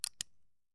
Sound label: Cowboy Revolver Cocking; SoundsCrate